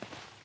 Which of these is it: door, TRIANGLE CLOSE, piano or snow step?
snow step